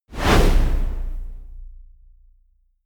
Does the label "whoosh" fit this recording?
Yes